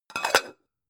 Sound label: pan close